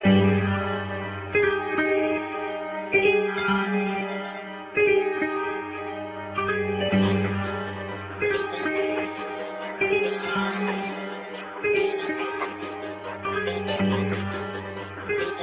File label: Liquid Piano